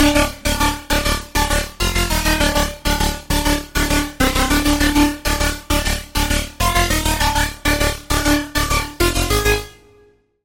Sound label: Lead; Blood Klan; Routed Sound; Serum 2 Expansion - Doom Carpenter Vol.1